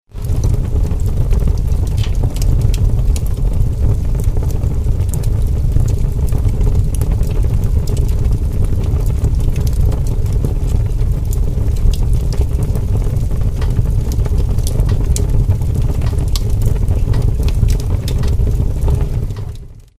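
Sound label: strong burning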